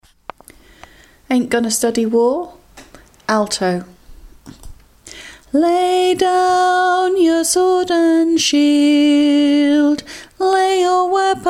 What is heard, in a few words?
alto